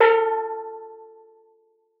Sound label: A3, STEEL DRUM; Xsample VOL-4